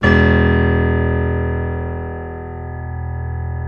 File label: PNO FF; Synclavier Sampler Library